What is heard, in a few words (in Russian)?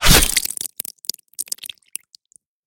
smert chudovisha ot odnogo udara machete